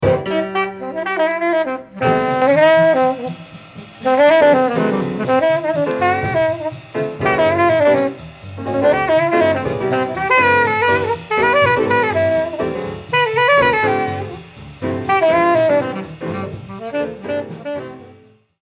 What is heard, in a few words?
solo